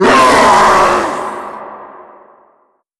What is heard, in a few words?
death, zombie vox; creatures